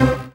Dance Mega Synth; Best Service; 90 s Sample CDs

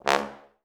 TB STAC C#2, Advanced Orchestra; Best Service; Peter Siedlaczek's Advanced Orchestra

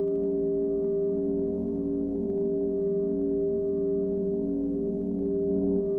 Tonal Re Draw, sparse soundscape

